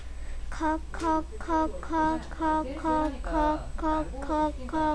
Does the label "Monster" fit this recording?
No